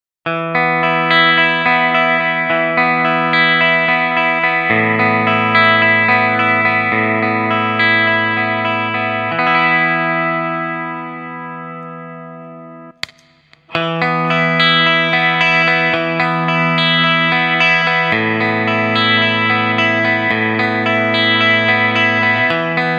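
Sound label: ByPass - Slightly Compressed - Rectifier, guitar; Rectifier and Color active; SardineCan Demo2 : Part 1: ByPass - Part 2: Slightly Compressed - Part 3: Rectifier and Color active.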